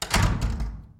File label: door